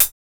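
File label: hi-hat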